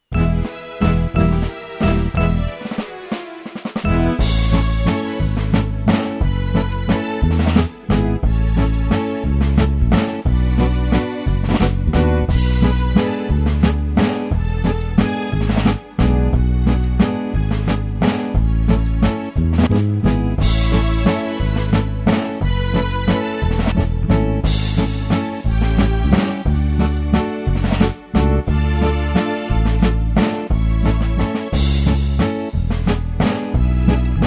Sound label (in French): valse viennoise